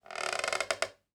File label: wooden floor creaking; nature